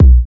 BD Sub; VEC1; Club

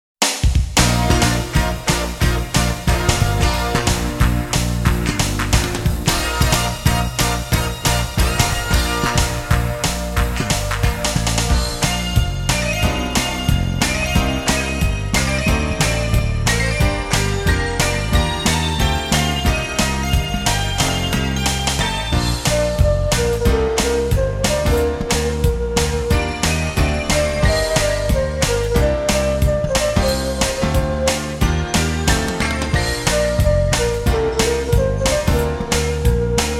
Instrumental, Music Box; Luna; Sailor Moon; Аниме

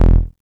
Bass E, Offbeat Bass; Club; VEC1

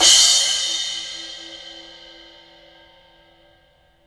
Cymbal, Hip-Hop, Drum Kit

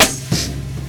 Snare

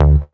Bass D, Offbeat Bass; Club; VEC1

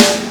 Snare; Takebeat; Club; VEC1

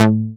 Bass A, Offbeat Bass; VEC1; Club

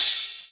Hi-hat, Hip-Hop

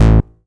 Bass A, Offbeat Bass; VEC1; Club

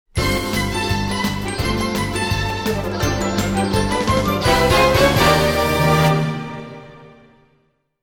Subtitle Music; MusicBox; SMS

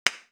Delay Clap

